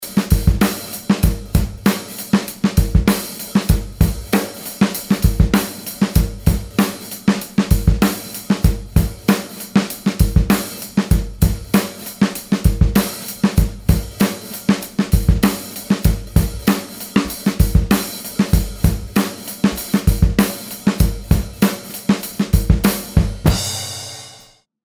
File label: Drums